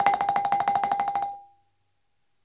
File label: BALAFON G; E-MU Producer Series Vol. 7 – Old World Instruments